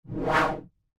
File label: Infographic data chart zoom in